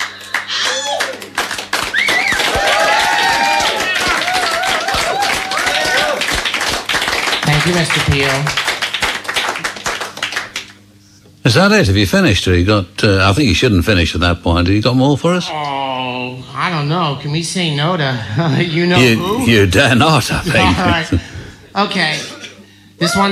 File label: Audience